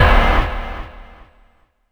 Bomb Stab; Percs

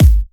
Bassdrums

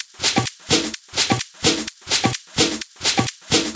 Percussive Loops 128BPM; Vengeance Samples

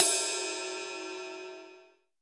Ride, Cymbals; Vengeance Samples

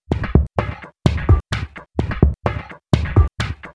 Minimal Loops

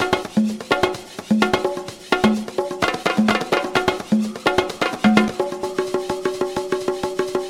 Latin House Loop 128 BPM; Vengeance Samples

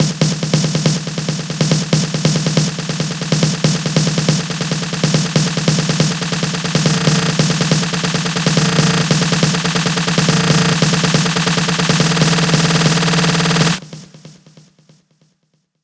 140BPM SNARE 8 Bars; Chrome; Vengeance Samples; Snares&FX Pairs

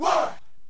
Chant

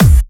Bassdrums Clubby Kicks; Vengeance Samples

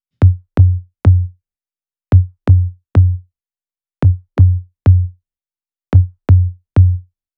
126BPM Melody; Cubic Kit; Vengeance Samples

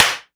Percussion; Vengeance Samples; VEH2

